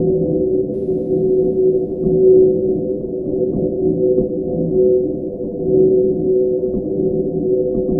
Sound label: Drone Ambient; Drums & Drones